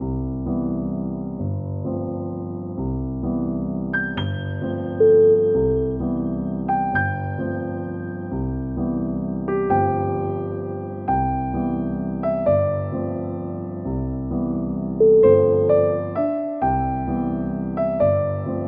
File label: lovely waltz